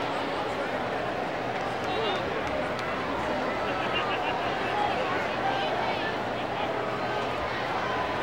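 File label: baseball crowd ambience loop; SFX Kit; Crowds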